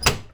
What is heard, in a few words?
CLACK, household; AKAI Anime Sound FX